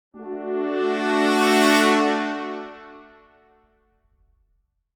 Horn Swell

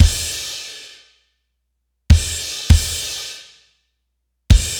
Break Drums, metal; Метал

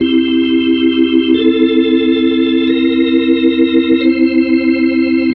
Corner 2nd, keys; Сэмплы клавишных (Хип-хоп); Hip-Hop; 90BPM; Клавишные

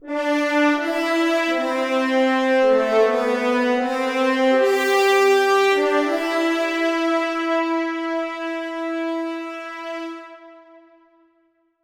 Horns, brass

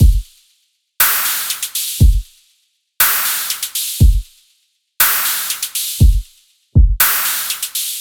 Crimy Kit

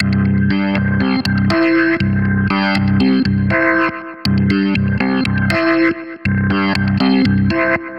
Jump org, Rock Alternative

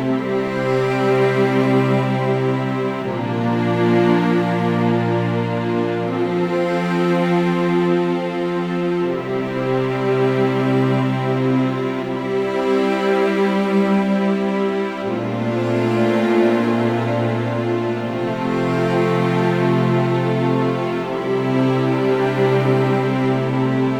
Sweet String Seq; ambient

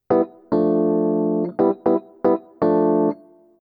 Rhythm B, джазовый сэмпл keys; jazz

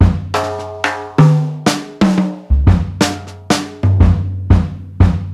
Jazz Kit B; электро-джаз

